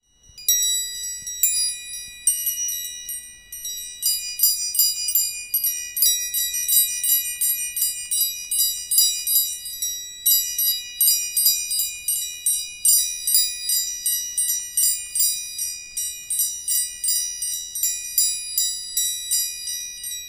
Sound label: doorbell; doorbell jungfrau